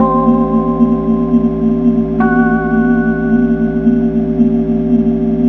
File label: Woods Game Music; Spookware